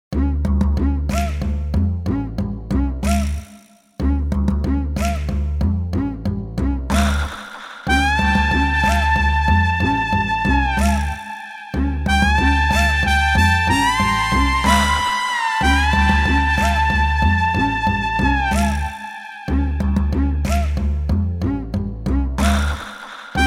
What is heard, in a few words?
comical